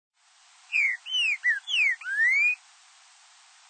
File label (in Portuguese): Canto Boiadeiro Classico